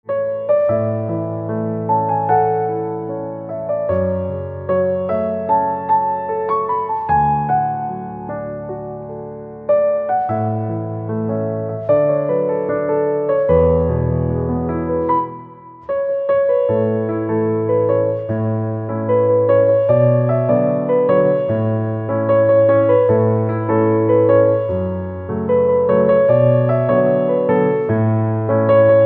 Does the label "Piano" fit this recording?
Yes